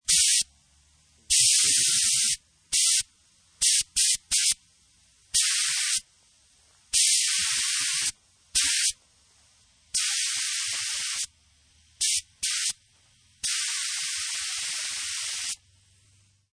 Paint Spray Gun Leak, Aerosol, paint spray gun. Several bursts of varying lengths; Very slight leak in valve; Spraying; Lynne Music